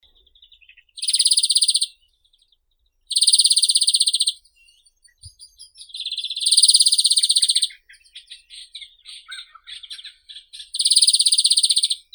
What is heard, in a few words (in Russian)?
zelenushka obiknovennaya chloris chloris